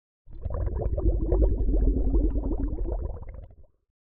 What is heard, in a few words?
Bubbles Underwater Medium - Submergence; Hollywood Edge; sound designer's toolkit v2